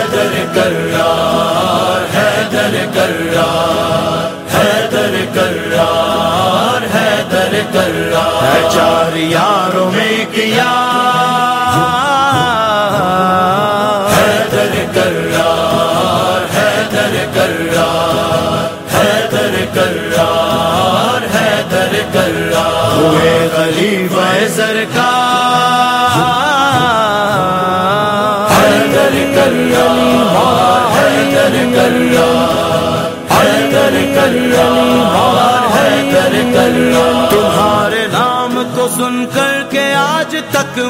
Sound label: Islamic Ringtone